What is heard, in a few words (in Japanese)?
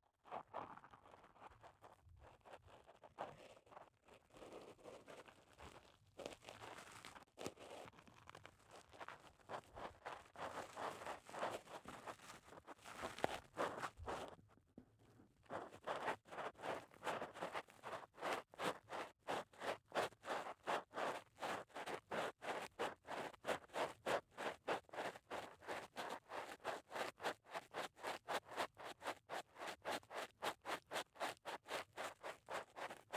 竹耳かき RL